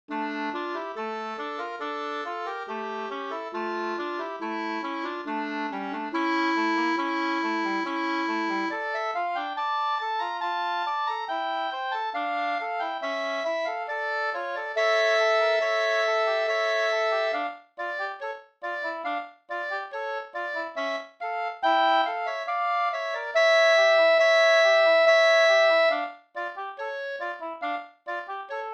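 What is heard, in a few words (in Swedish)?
brudmarsch Brudeslatten fr Sogn, Norway 2 stämmor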